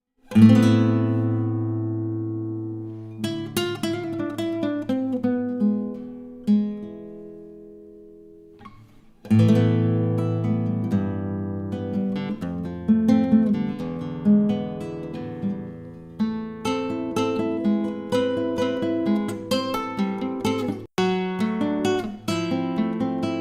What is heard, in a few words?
Daddario EJ45 test sound